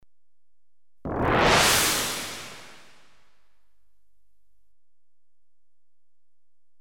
Single severe crash, Electronically Generated Sounds; Sound Effects Library